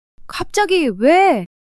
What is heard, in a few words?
surprise